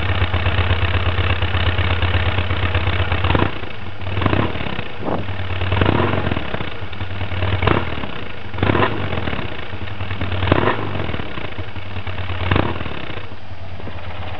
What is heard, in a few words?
idle and brip; Tune Up